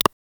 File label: simple video game click, button